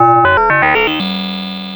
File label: POLYMOD; AMG - Vince Clarke Lucky Bastard